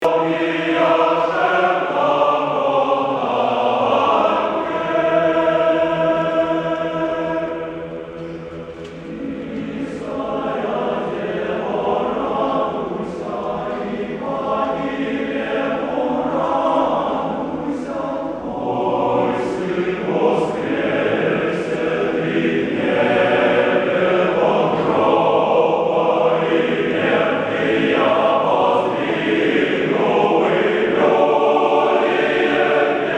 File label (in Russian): ангел вопияше, choir